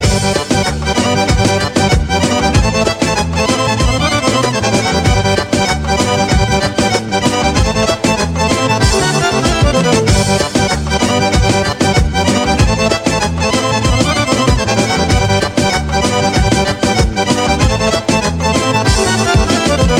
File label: romanian music